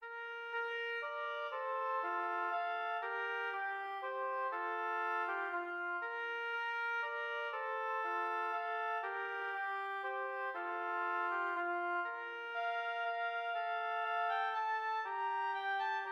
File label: chaconne ob duet